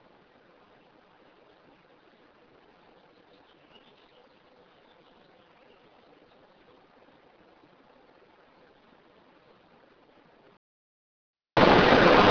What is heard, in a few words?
Brushing Teeth; Scratching noises that sound like someone brushing their teeth; Original x1 Amplified and cleaned x1 further cleaning x1; Bisbee/Tombstone